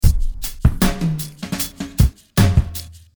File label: Drumloop